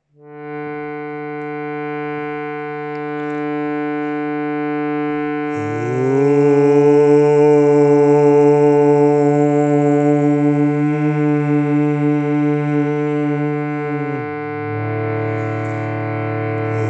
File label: 3x OM, 3x Shanti, Hari Om